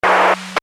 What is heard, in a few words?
Emergency Radio Static Police Radio; Sound Design